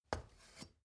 step ladder wood single

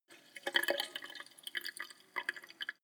sink drain; haus garten; badezimmer

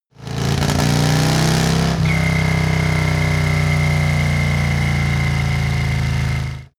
motorcycle harley accelerate decelerate whistle

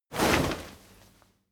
crowd movement single hard; bewegung; soundpool

